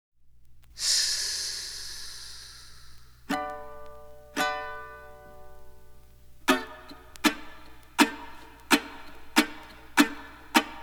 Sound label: train song, ukulele